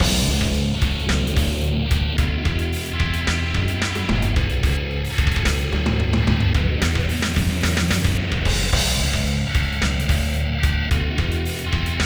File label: Gameover BGM; Kenju; prototype atomiswave gamerip 2004